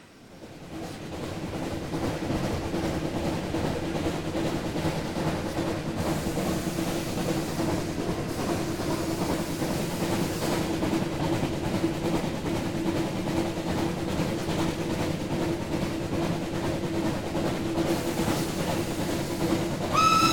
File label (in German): Dampflok